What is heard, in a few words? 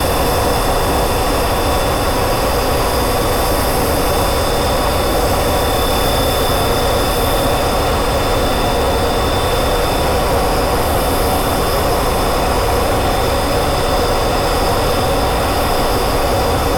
fighter cockpit